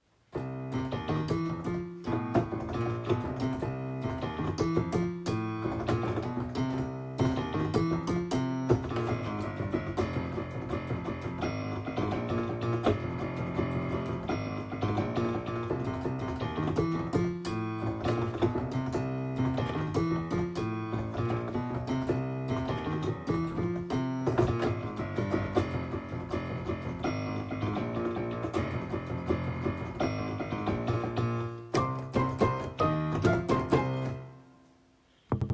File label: Funky Groove